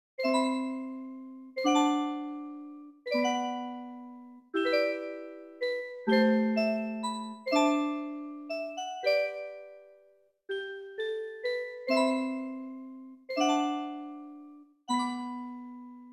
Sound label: Lullaby